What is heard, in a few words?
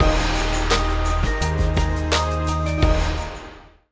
music; metalgears; OK K.O. Lets Play Heroes; PS4, Switch, Windows, Xbox One